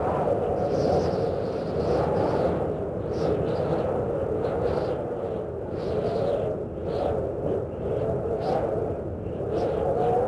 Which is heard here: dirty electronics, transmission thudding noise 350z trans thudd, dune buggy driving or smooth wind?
smooth wind